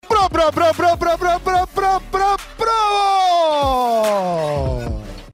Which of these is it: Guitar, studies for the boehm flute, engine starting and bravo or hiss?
engine starting and bravo